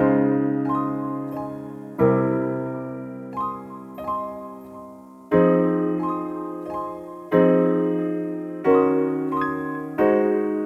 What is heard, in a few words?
90 BPM G# Maj Piano; Dreamland